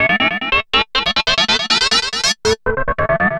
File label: Atonaloop, Industrial Analog